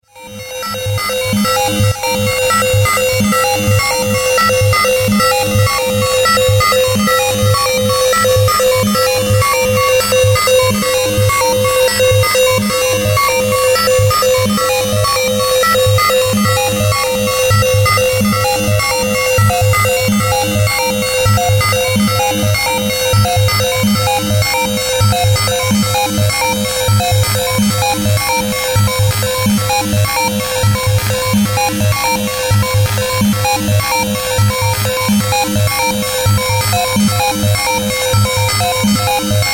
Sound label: percussive movement streaming pulses